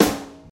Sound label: acoustic snare C sharp key, steel snare drum tuned to the C# note. Loudest frequency: 1861Hz